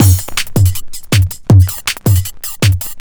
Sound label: JUNGL 160, JUNGLE LOOP